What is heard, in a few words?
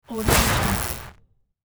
lightning strike; gameaudiosource